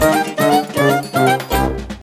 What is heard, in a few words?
mus cage forcefield; jungle world